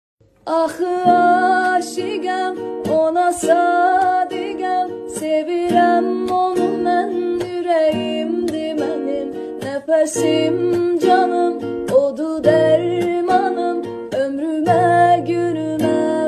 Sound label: zan gitar